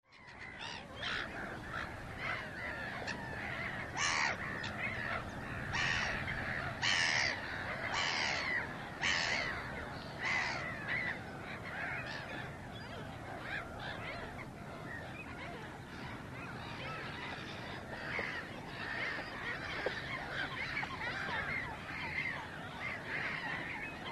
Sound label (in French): les mouettes